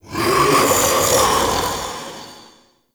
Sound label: Male Vocal Attack; Characters; Enemy; Vocals; Mockeries